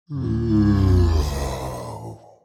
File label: Demon king lord yawn